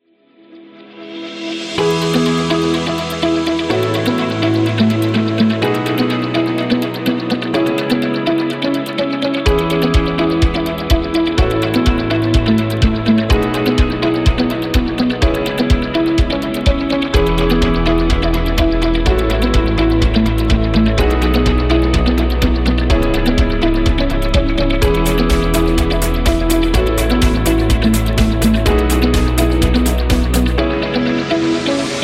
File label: Inspiring Beat; business background